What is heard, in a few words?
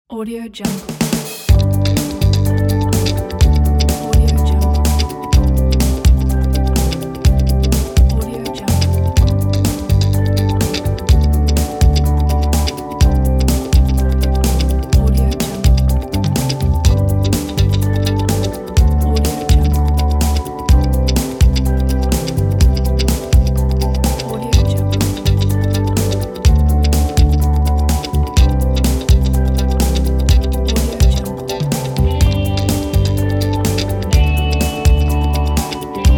corporate; gevstudiox